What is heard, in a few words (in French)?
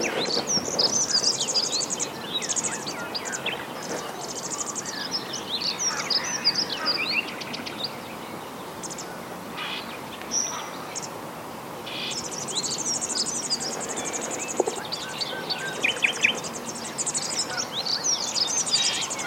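bergeronnette grise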